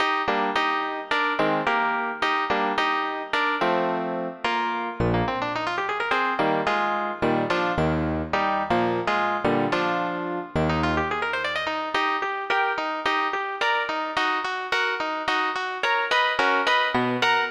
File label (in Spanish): MELODIA